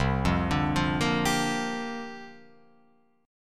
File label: C 7th Suspended 2nd Suspended 4th C down Guitar Open F; C7sus2sus4 arpeggiated